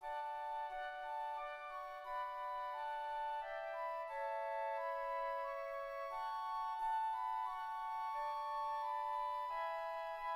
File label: Hymn Flute